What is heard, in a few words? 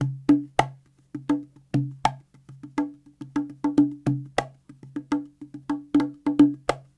Cahon